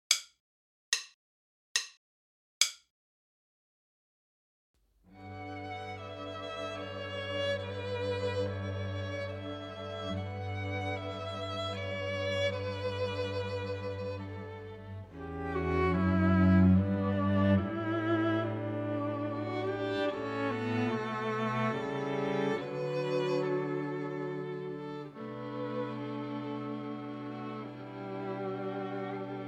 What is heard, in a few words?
minus Violin II